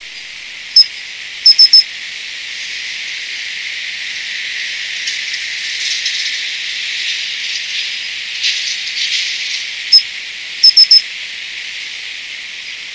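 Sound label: cold pine cricket; matsumushi; coldmatsumushi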